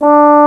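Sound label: Bass Trombone mf D3; Digital Sound Factory Virtuoso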